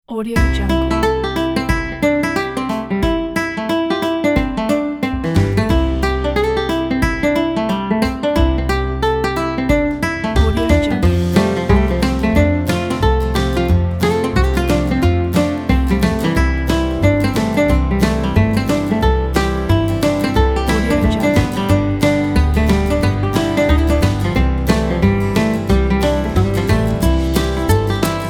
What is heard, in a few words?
acoustic guitar picker; spicer1